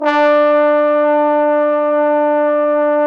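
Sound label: Bone Sec, trombone section; Roland L-CD702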